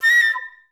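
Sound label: flutes A5; Best Service; Peter Siedlaczek's Advanced Orchestra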